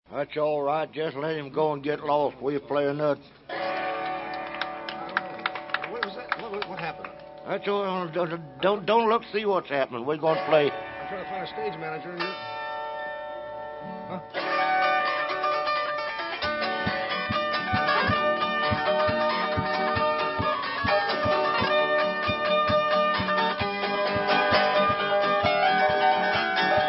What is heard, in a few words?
instrumental